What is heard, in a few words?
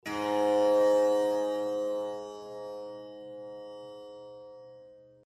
tampura; Latcho drom